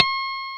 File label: E-GT HI, INST WAVES